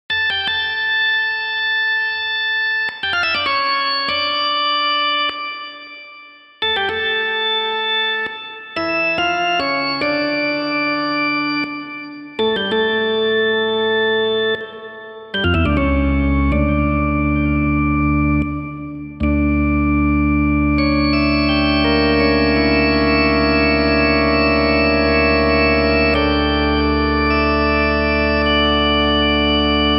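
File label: Toccata and Fugue in D minor; Bach; BWV565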